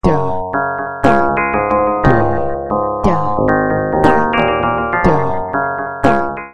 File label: sequencer; nyquist